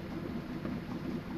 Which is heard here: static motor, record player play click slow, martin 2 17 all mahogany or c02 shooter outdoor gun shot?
static motor